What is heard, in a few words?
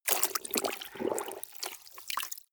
clothes water wring medium